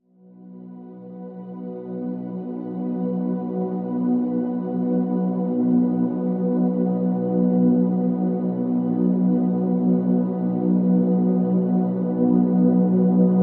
Pads (B)

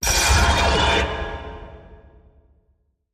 Big Echoey Sputter, Taylor Grand

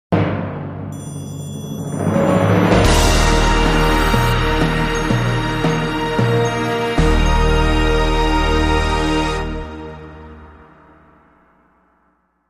Space Drum Roll Fanfare

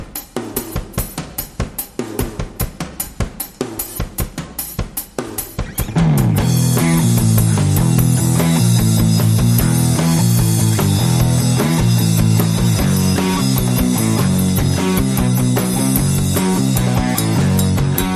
Chasing Hills, Non Fast Synth

